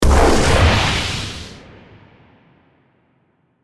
Rocket launcher single shot; artillery

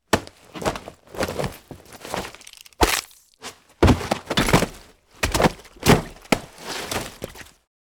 Fighting in the mud movements body impacts squish

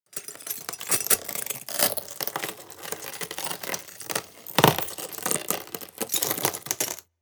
Glass crunchy pressure breaking

